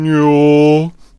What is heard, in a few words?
Healer Attack Vocals